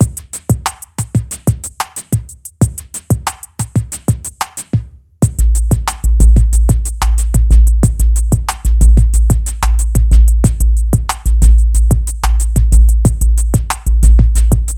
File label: cr78 dusty sticks